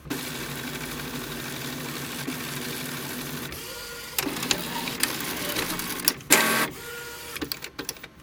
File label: Printer Dot Matrix